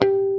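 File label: BASS harmonics G3; E-MU Formula 4000 Series Vol. 5; Protozoa; Proteus1 Presets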